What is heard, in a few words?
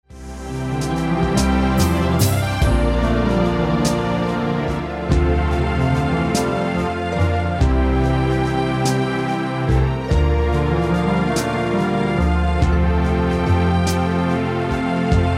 Blues